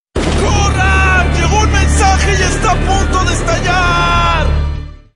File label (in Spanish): Mensaje Explosivo